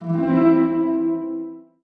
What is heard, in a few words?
guardian; weapons; dota; omniknight